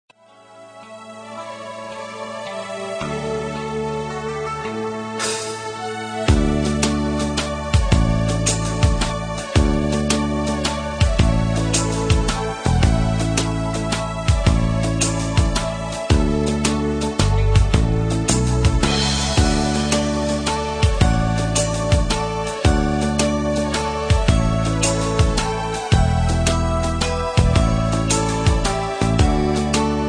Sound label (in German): Karaoke